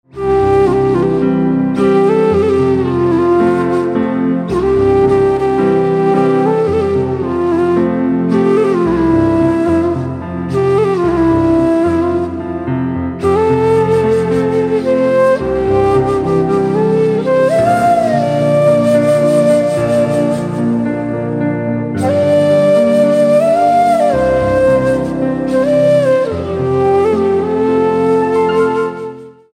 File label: Flute Instrumental Ringtone